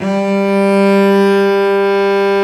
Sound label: STR Vc Arco p, String Master Series, Vc Arco Solo